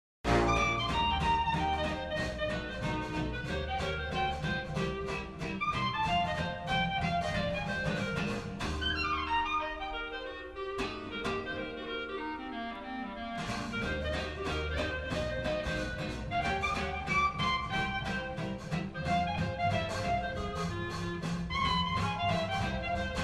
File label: rockwell solo